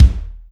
bass drum ff, drum kit; unpitched percussion

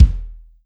bass drum mf, drum kit; unpitched percussion

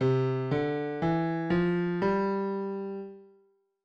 fragment up

